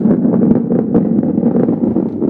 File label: rolling floor; ball rolling game